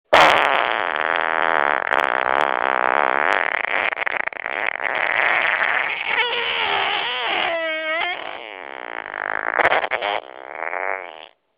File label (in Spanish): Cambio de voz, pedo